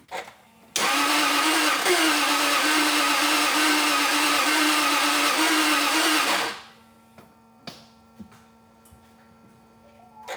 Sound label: engine noise, engine wont start; xk8; XKR X100